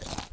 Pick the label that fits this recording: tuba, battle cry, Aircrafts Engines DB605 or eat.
eat